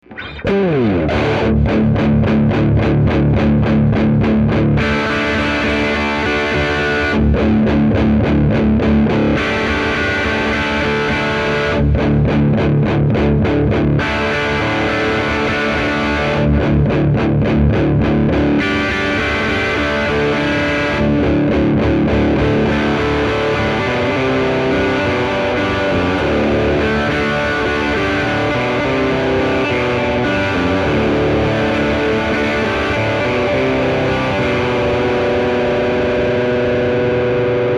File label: DS1 Disto Uno